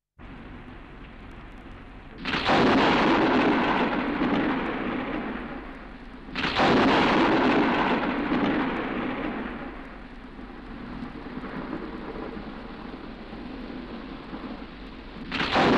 Temporale con saette

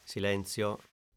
SILENZIO

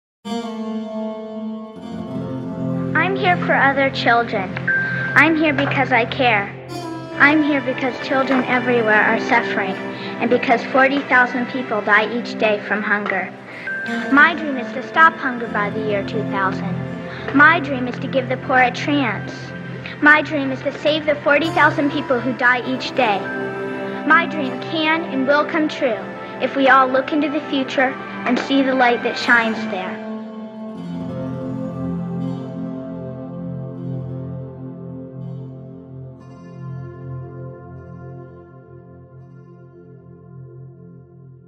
vox bimba e chitarra

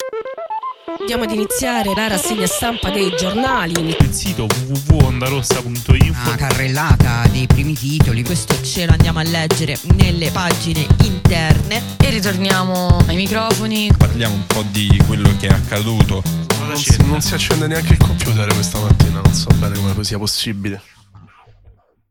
Sigla; Rassegna; Rassegne Stampa

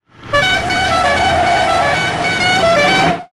clacson

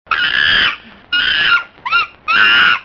ANIMALI - SCIMMIA

